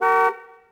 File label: car horn; Tony Hawk's Project 8; XBOX; houses